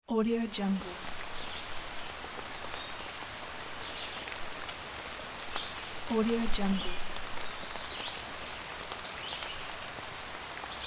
summer rain

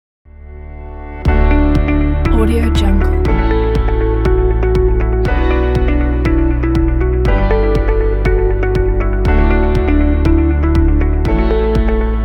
elegant intro corporate logo

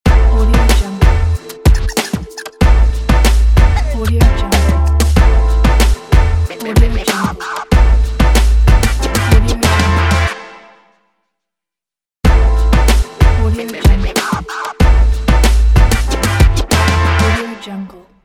sport hip hop ident pack

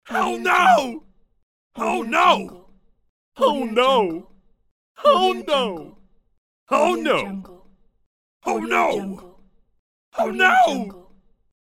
man screaming oh no

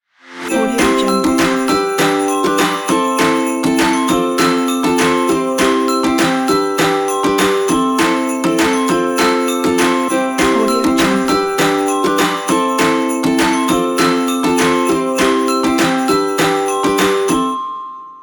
happy christmas

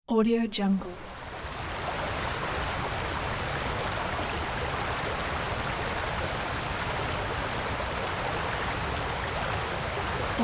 little waterfall

